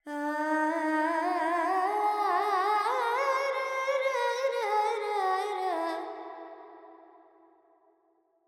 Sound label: Verb Charukesi, Carnatic Female Alaps; Sample Banks